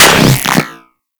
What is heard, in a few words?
Aim; Warframe; Weapons; Infested Tentacluster